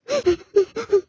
Hold scrotch fidgets loop, bedroom

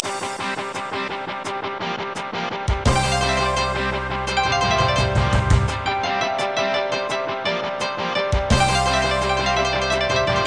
Swordsman Battle Theme; Yaminabe Aries Asu he no Chousenjou

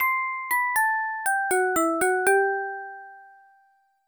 Babality, No Flawless Victory SFX

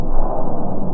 Missile Flying Loop; Cruelty Squad

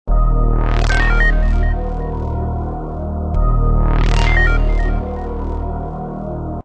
Menu Loop; Aqua Energizer Online

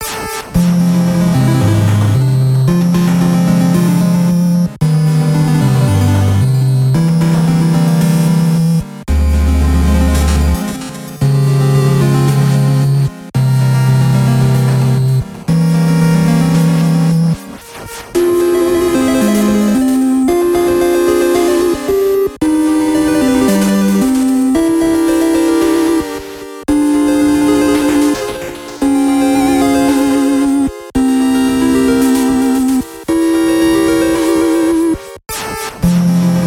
Deep Jungle Walk; Cathedral

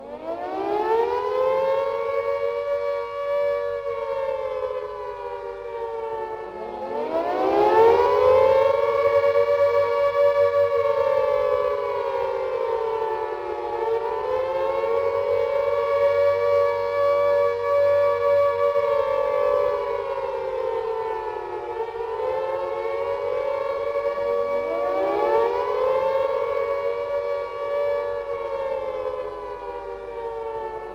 Leave battlefield warning loop; Red Wings: American Aces

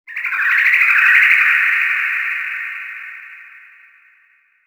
environment creature chirp

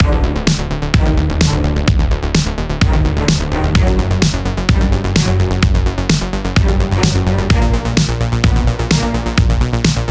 Bpm128; Duel Father Attaque Speed Part2

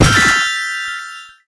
Character Defence

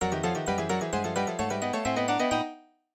MIDI Animation stings

